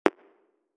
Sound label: Click